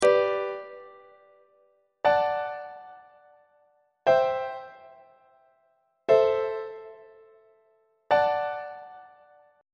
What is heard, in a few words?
g major half cadence on treble clef